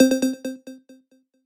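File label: phone notification sounds in 8-bit style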